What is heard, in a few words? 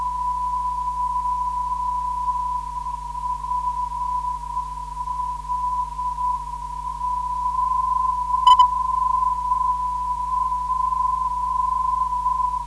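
318kHz, non-directional beacon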